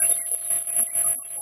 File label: Data Transfer